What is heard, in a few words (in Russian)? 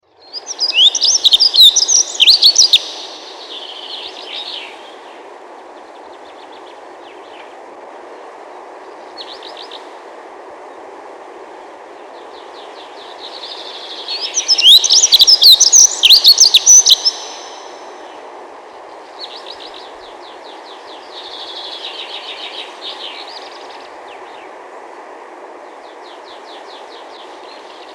завирушка лесная